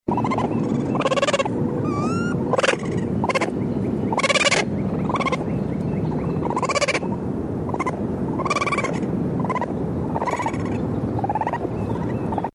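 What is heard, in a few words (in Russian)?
enot golos, raccoon voice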